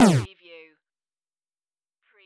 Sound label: zap; Button / Multimedia Sounds